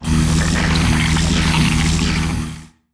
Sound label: hive, buildings; Factorio Paranoidal mod; erm zerg hd assets